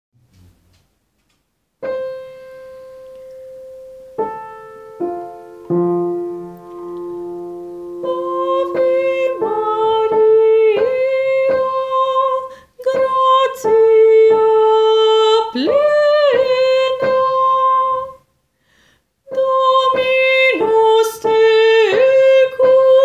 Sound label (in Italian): Ave Maria tenori; Ave Maria Arcadelt